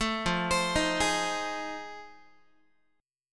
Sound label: F 7th Sharp 9th, Guitar; F7#9 arpeggiated